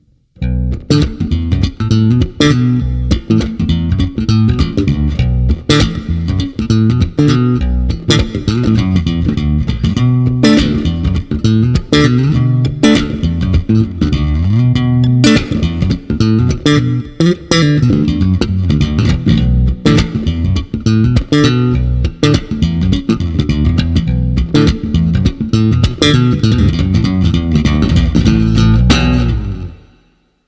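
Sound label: funk chords, basso elettrico; Biarnel Dual Coil lowZ; Attiva a tre vie; Acero occhiolinato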